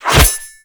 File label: Machete Whoosh; Kahl Machete; Warframe; Melee